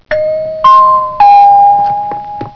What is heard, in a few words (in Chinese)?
门铃; 日常生活; 特酷声音素材库